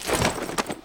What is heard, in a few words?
jump, hunt